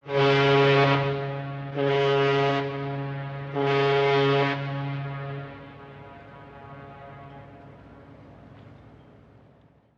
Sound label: Msg Tone Horn X3